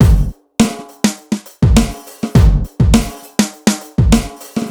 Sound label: Big Kit, V Drum; Sonic Foundry (Sony Creative Software); Electronic Point Blank; Drums & Percussion